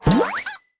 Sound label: Boing; lego my world first steps